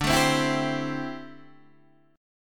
D 9th Suspended 4th D down Guitar Open D

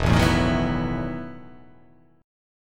E Major 7th Suspended 2nd Suspended 4th

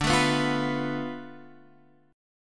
D Minor 6th Guitar Open F; Dm6 strummed; JGuitar

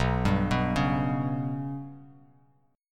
B Suspended 2nd Sharp 5th B down, Bass Standard; Bsus2#5 arpeggiated; JGuitar

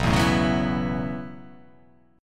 B 9th Suspended 4th B down

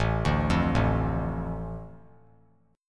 A Suspended 4th Sharp 5th; Asus4#5 arpeggiated; JGuitar

